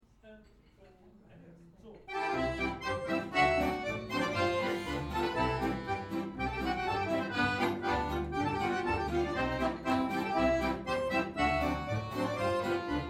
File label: Cirkus Polka, accordion club; Äldrehemmet Ångaren